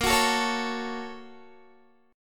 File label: Bb Minor Major 7th Sharp 5th, Guitar; BbmM7#5 strummed